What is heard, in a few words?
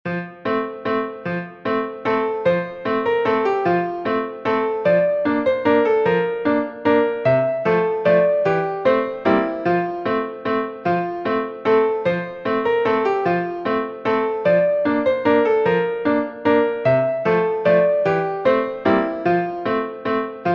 waltz